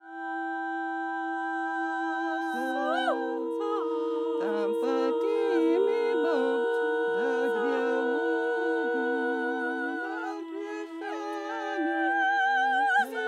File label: traditional voices